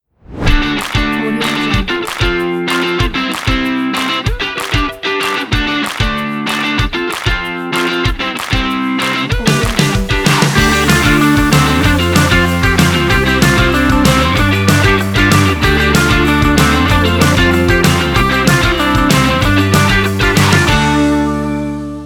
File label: upbeat happy indie pop rock logo